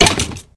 skeleton; thirtydollarwebsite

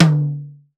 tom; thirty dollar website

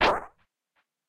super jump; ovo

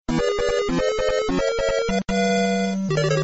Hurry; mario

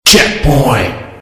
checkpoint; geometryrash

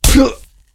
hit; tekpro

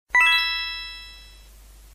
itemsound; geometryrash

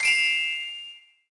win; cut the rope

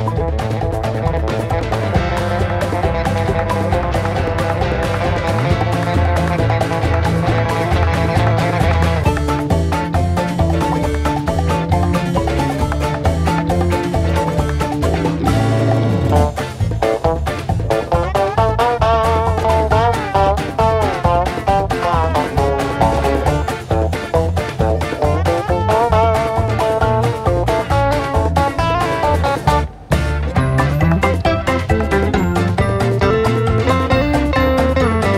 music chase; pizzatower

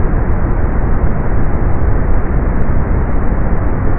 tires; polytrack

